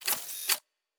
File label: Weapon Reload; Sci-Fi Sounds